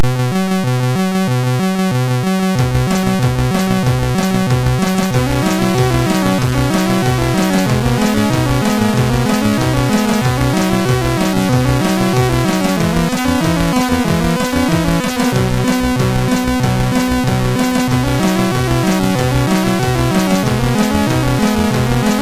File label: Melody sid MOS6581R3